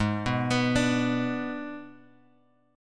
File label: G sharp Diminished G sharp; G#dim Chord {4 2 X 4 3 X} arpeggiated